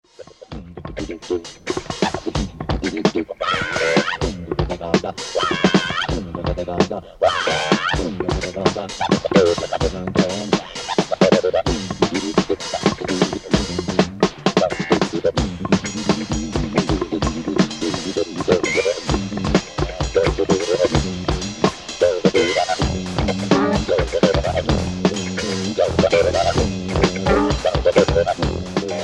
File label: Funk